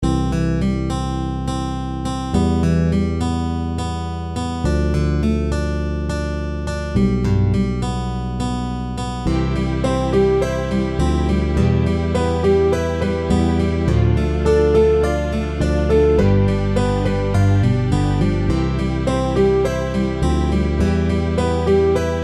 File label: Song Instrumental